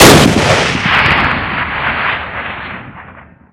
springfield shoot, weapons; svencoop; ww2projekt

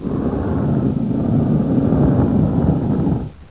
rock

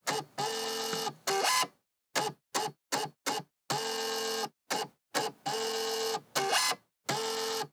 machine office

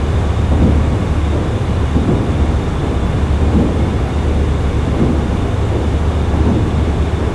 pipeline pump loop; cscz; de otaku cz

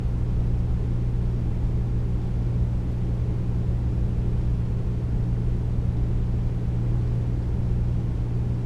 corridor room tone

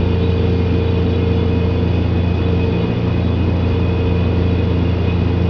refrigerator loop

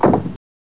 door

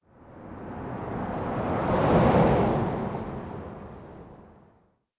car industry

